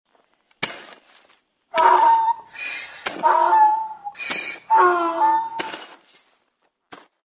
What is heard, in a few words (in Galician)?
sachando con burro